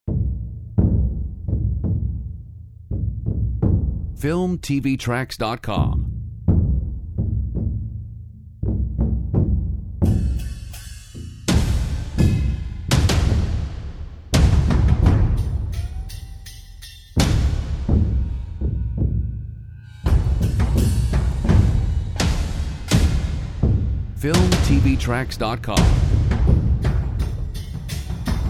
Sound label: Big Chinese Drums